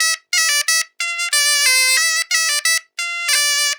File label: BOMBAR, Celtic Flavours